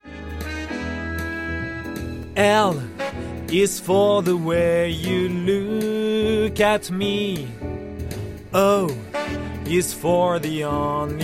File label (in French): chant love